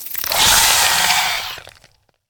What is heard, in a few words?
Boneweb Spider